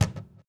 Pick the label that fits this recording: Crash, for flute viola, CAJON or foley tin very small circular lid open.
CAJON